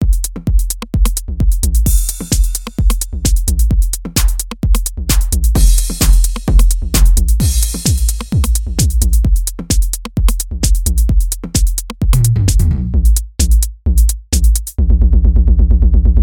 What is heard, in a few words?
tape 909, 65 Hz